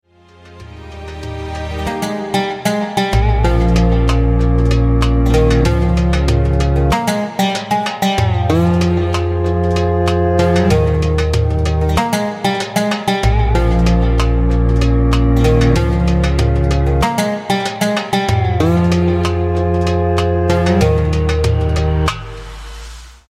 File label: Acoustic